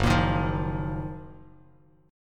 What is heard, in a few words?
F sharp Major 13th Bass Standard; strummed; JGuitar